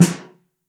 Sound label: SN Mono, Snare